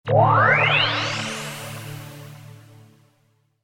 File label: Power Up